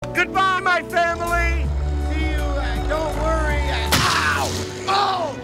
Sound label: tree hit; farley